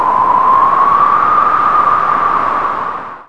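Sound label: strong wind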